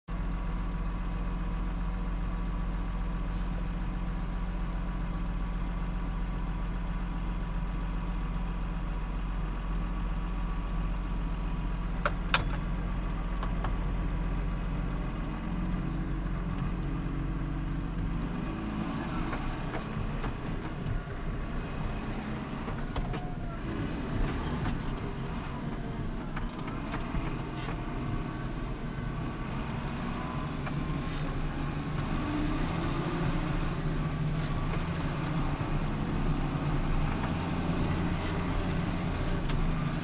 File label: Industrial Mechanical Agriculture Potato Harvester In Cab Long Reversing Engine Revs; Tractor